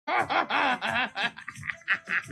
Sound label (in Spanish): dulce risa malvada